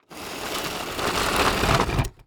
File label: Impact Toy Drag On Cement